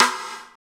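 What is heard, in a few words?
snare drum rim; Sound & Vision - Gigapack I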